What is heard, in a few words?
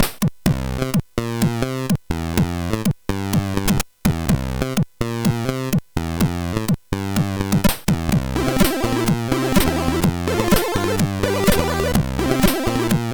Intro music, CSG8580R5; HCL; hvsc